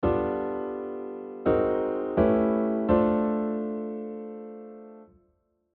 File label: parallel chromatic; parallel harmonic movement